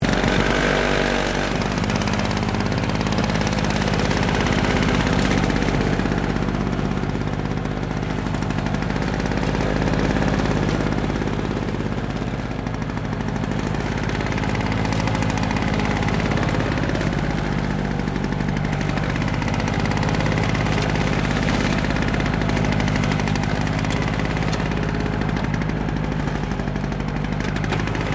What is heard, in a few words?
LAWN MOWER OPERATING CLOSE LOOP, Old lawn mower; Victor; Lawn Mowers; Machines